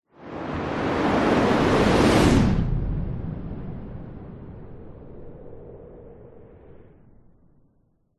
sound design cinematic whoosh fast close big airy tail; zapsplat